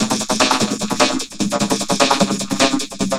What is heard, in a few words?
Vocobeat; Sonic Foundry (Sony Creative Software)